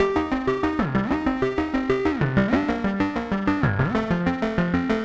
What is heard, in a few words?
Disto Stomp Mix B 95 C, modular 95bpm